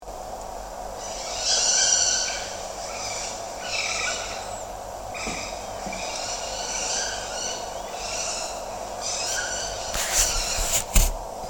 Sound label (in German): waldkauz; Waldkauz, Bettelrufe von Jungvögeln1; Mag. Peter Holub